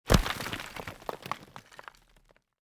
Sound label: Stone Impact; Redmatch 2